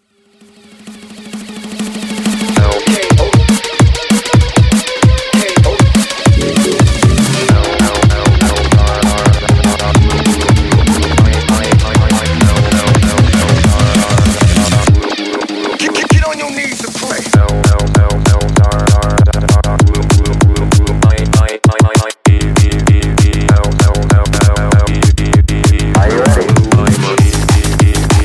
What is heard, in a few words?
fight music remix